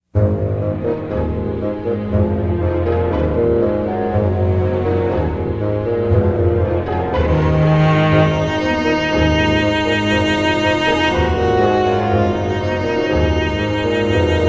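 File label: Campaign Music; Heroes of Might & Magic III HD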